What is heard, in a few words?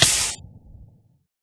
fire hurt, player; Minecraft